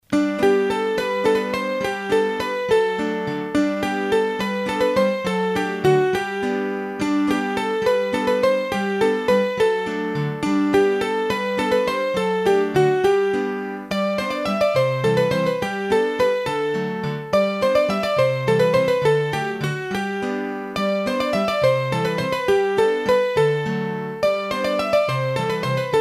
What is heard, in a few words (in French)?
Bourree Croisee du Morvan, hurdy gurdy; Massif Central; Bourrees a 3 Temp